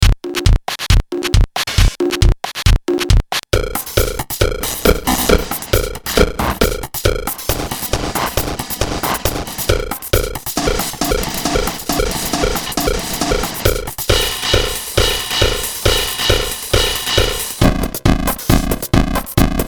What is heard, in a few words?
SR16; SR16demo1